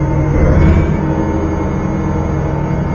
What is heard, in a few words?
drone loop; hl2